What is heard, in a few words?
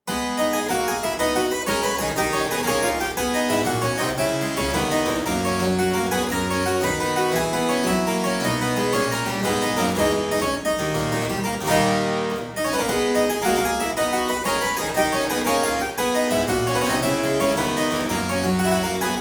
Suite in A minor Gigue, Harpsichord; Buxtehude; Complete Harpsichord Music; BuxWV deest